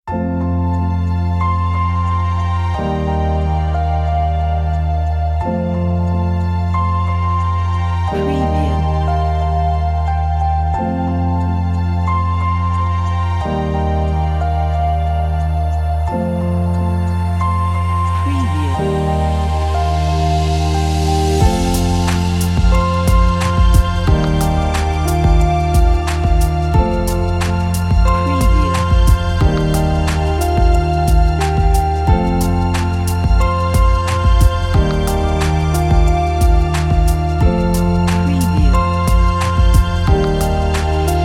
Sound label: different music loops; motionarray